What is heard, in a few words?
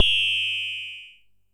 whistle, Coron Drum Synce DS-7